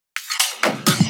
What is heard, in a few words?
Fill 128 BPM; Vengeance Samples; VEH1 Loops